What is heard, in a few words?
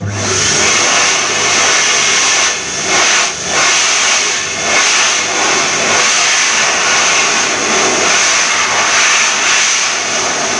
hand dryer solos, museum; postal museum farringdon